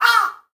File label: corvus macrorhynchos cry